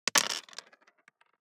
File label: DICE on Plastic, Throw and Roll, Standard, 4 Four Dice; Samples; ArticulatedSounds; DICE Sfx Party (Extended)